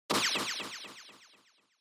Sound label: Motion Agility Delay; モーション 俊敏; ディレイ